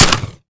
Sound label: mech; weapons; m1014; Urban-Coalition; Decay; arccw ud